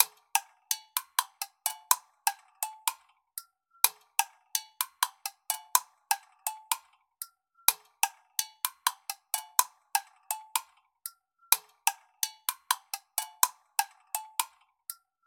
Rattly 125 BPM, percussion; перкуссии percussion loops

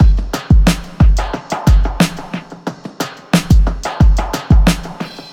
Big Snare; чиллаут

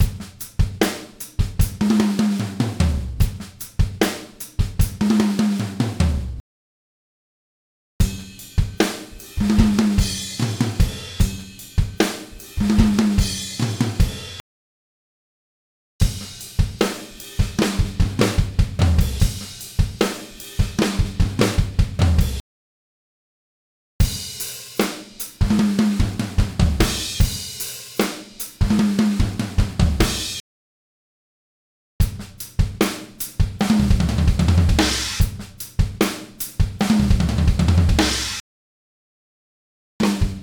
Heavy Rock Drum Fills 75 BPM; Ударные (Drum Loops)

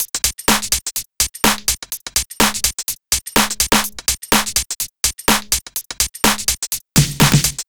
Steppin Beat, dance electro; drum сэмплы

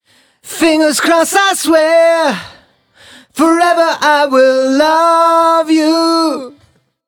Chorus; Rock & Pop

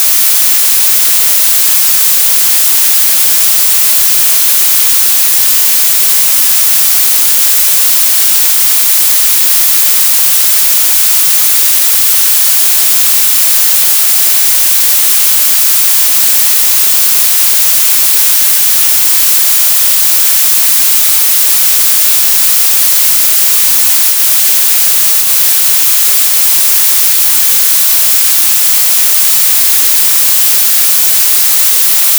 Blue Noise

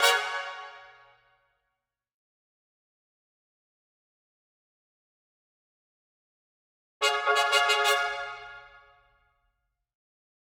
Staccato Trumpets

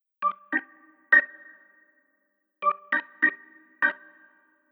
Organ; сэмпл клавишных в стиле funk

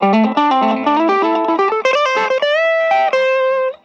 Guit Solo, guitar

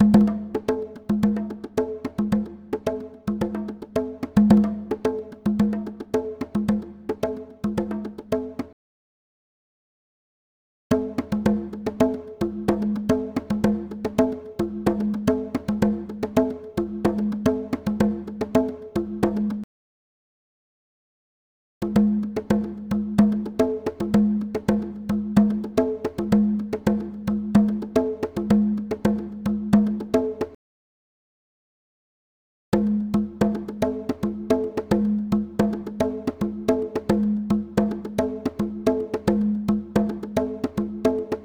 Latin Conga 110 BPM; Перкуссии (Percussion Loops)

